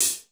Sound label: POP CLHH; Crypton - DrumBase5000; R70 KIT #1